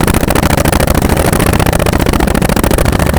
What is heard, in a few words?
roll tile, terrains; Tony Hawk's Project 8; XBOX